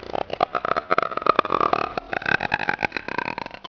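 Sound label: warrior idle, bugs; Starship Troopers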